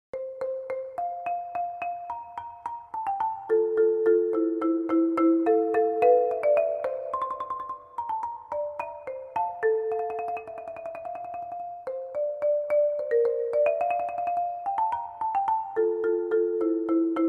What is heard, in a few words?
keyboard